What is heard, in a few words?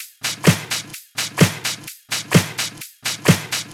House loops 128BPM; Vengeance Samples